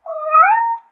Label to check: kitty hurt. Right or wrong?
Right